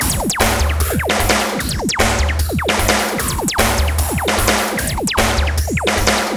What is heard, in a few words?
LOOPS 150; Masterbits - Tekkno Trax; D LOOPS